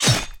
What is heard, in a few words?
Sword; ChooChoo Heroes